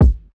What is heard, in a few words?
Kick Knock, One Shots; Cymatics; DOPE DRUMS VOL. 1